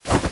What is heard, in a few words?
wiz steps